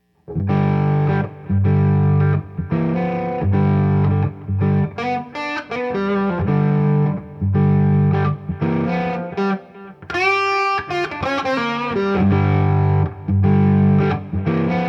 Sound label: MSD Silverstone, demos audio; pedal; MSD Musician Sound Design